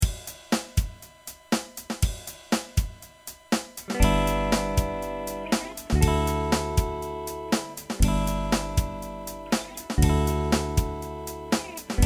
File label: Smooth Ride Through the Rain, guitar